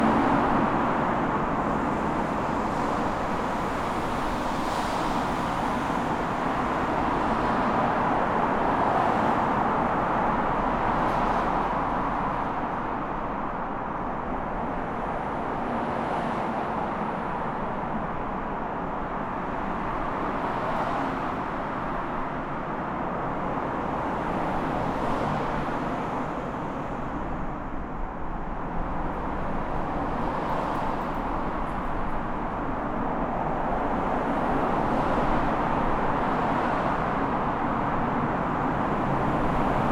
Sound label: CAR WIDE LOW CUT 150